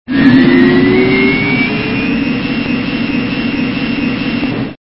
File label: Takeoff